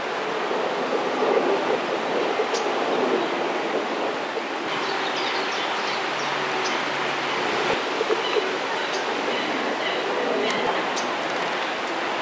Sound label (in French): bouscarle cetti